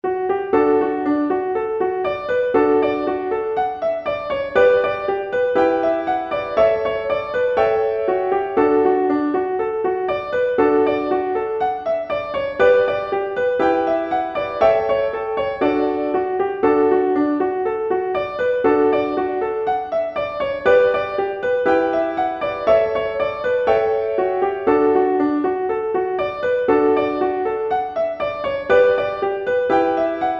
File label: hornpipe slow